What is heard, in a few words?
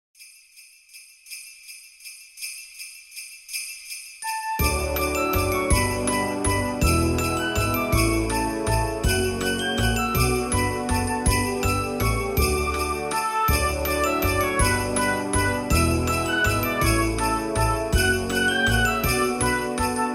town christmas